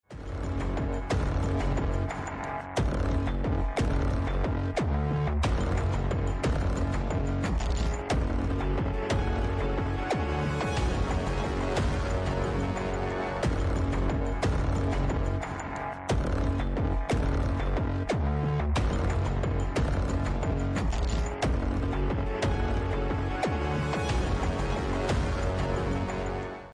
warning

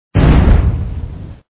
brown Wizard

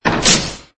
pickaxe

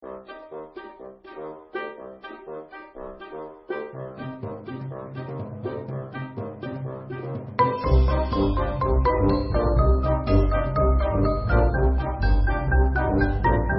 Funny

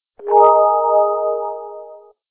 game tea

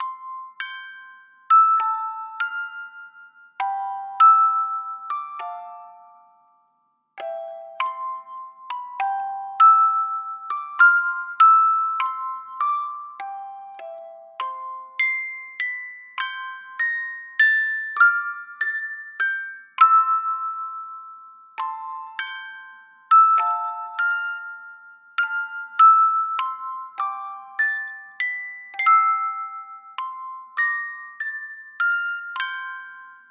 music box

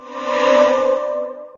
Magic

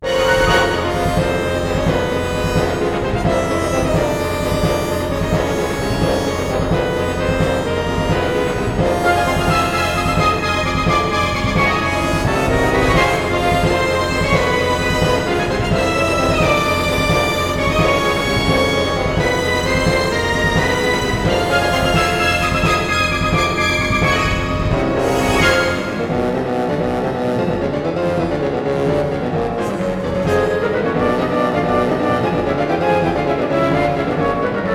Last Boss

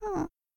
Voice Breathing

